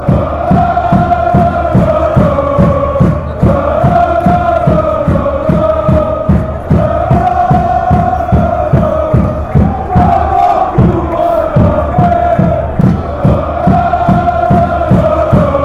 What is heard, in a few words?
come lololo gent, fanchants